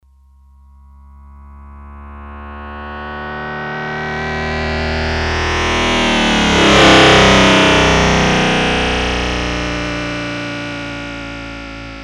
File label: Yamaha TX802; Factory Patches; Voices